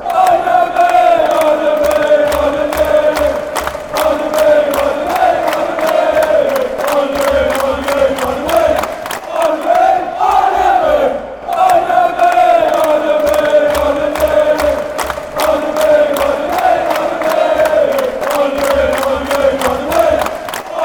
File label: chant, fan chants; vitesse